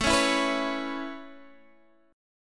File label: A Major Add 9th, Guitar, Drop D; chord